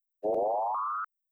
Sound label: enlarge on